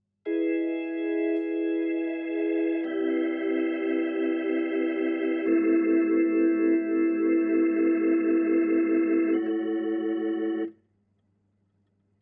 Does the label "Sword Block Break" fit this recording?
No